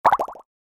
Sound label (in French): bulle; transformice